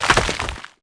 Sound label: impac builds; lotr bfme2